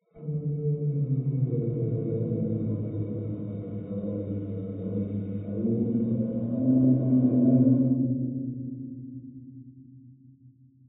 animatronic moan; slender; tjoc

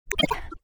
jerky, spirit; slender; dbd

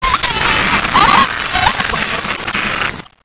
chase init; slender

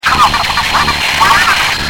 chase; slender

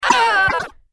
stun, spirit; slender; dbd

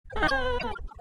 sad, spirit; slender; dbd

